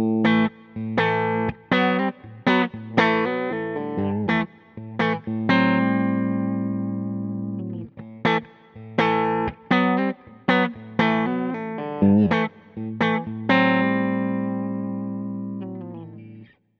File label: Seymour Duncan Bridge Humbucker Clean; Tesla Pickups vs. Roswell